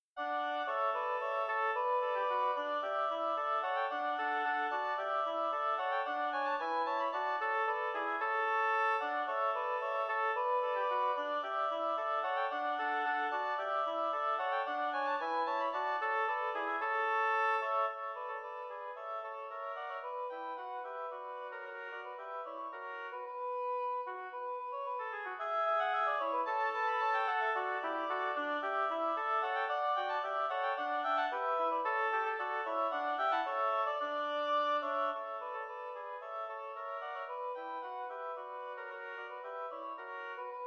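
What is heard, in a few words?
bouree menuet oboe trio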